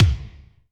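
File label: Kick Stab Room, Alesis D4; Kik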